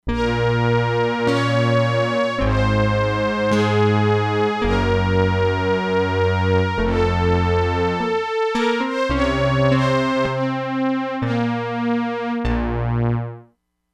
VELOCITY CONTR. STRINGS + BRIGHT PIANO, Crumar Bit 99; Factory Programs